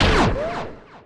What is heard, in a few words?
warpgate bounce; Tanks